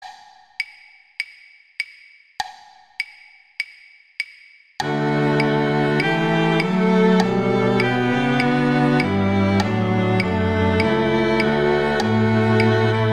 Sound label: Violin II; Quartet; Beethoven; Ode to Joy